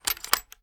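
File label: foley reload start load; weapons; gmod; viper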